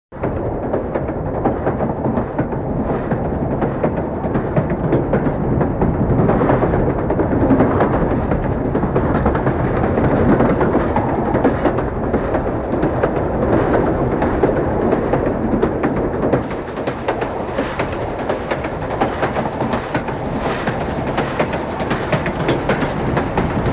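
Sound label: train window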